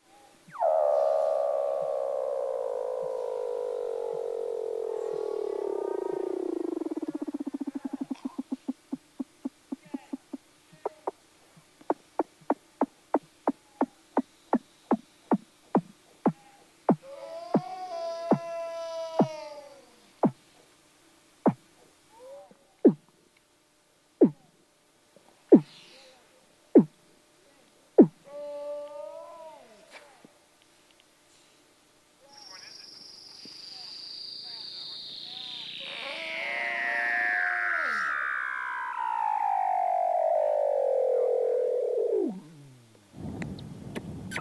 Weddell seals sounding above water

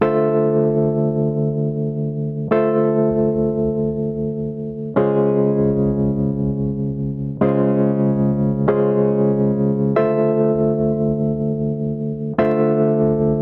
chords

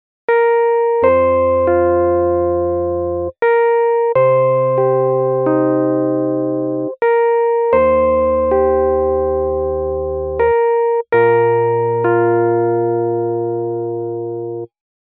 Bridge intervals

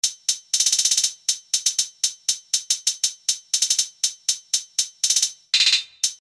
TRAP HI HAT PATTERN

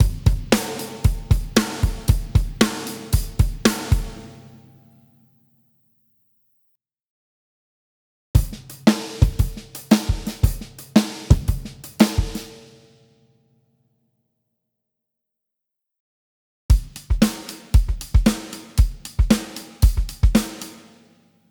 i IV All Drums

